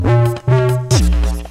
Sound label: Message Tone; Eskimo